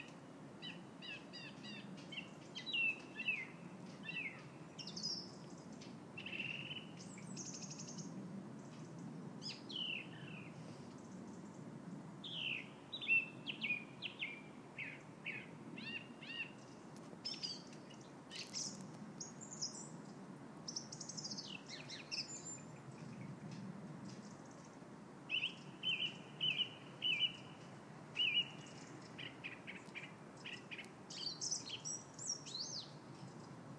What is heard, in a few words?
birdsong in november loudest i ve ever heard at the back door